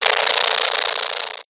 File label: science fiction radio signal interference burst